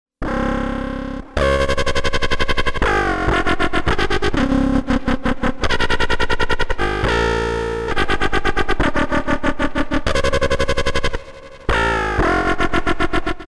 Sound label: Dubstep; Dotcom